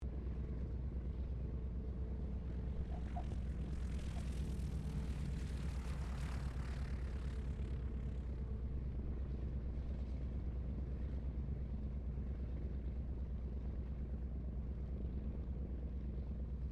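AIRCRAFT LOCKHEED L-1049 SUPER CONSTELLATION SHUTDOWN RIGHT DISTANT; 1955; Connie, Super Constellation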